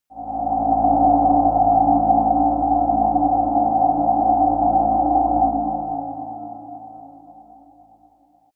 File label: sci-fi quiet planet, drones